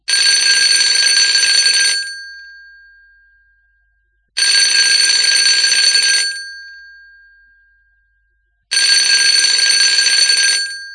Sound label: Doorbell old tring modified multiplied low quality